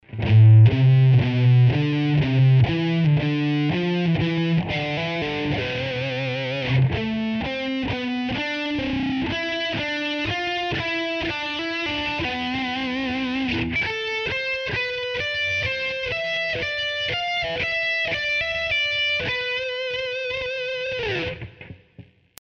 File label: scale patterns slow, guitar